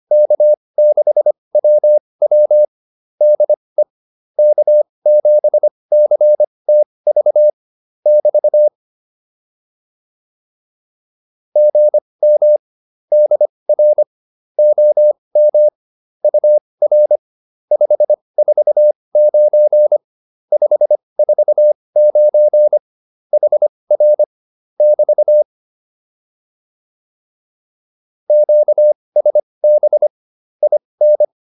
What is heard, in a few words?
QSO, intermediate practice files